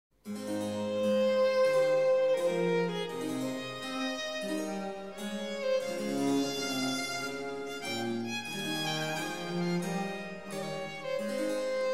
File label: Violin Sonata in A minor; Violin Sonata in A minor - Andante-allegro-adagio-allegro